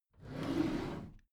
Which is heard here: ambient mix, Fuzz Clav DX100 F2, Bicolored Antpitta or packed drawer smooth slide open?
packed drawer smooth slide open